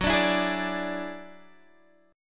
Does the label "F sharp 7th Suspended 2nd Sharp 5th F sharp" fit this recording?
Yes